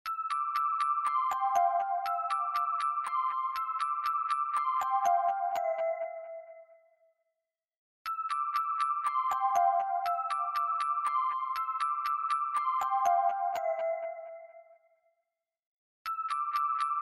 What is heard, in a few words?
Music Box Melody